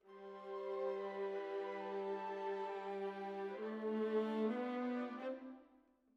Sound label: Violas, jazz for piano